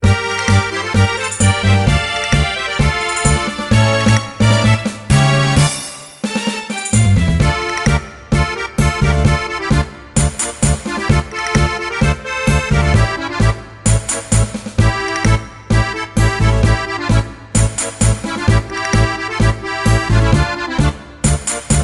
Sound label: Blue Birds Loop